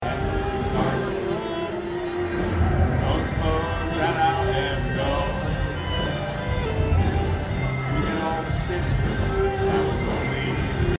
folk duo drowned out by blues band; ithaca ny

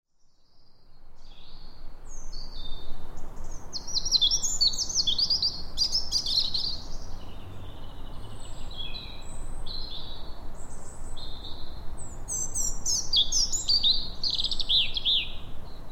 blackbirds on Telegraph Hill

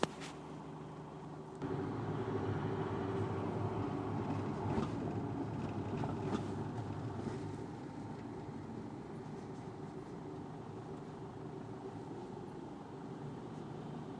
the sounds of cab

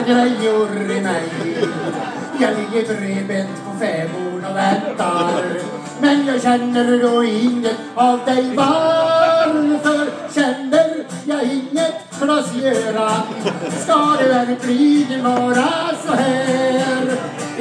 after dinner music the northern swedish style

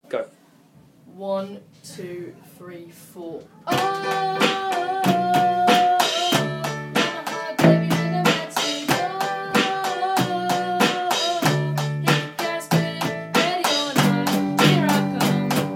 pre chorus chorus

